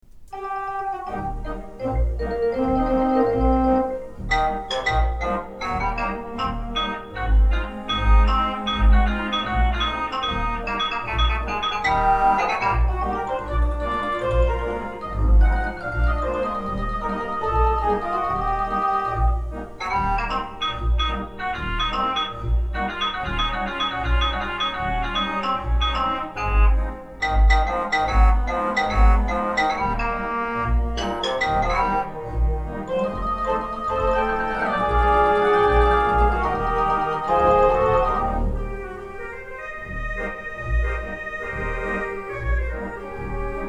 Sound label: Blues Medley, theatre organ